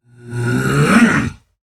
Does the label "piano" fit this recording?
No